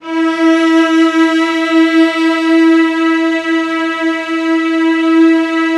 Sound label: VIOLAS F#4, Strings; ILIO